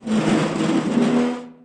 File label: push box, debris; svencoop; echoes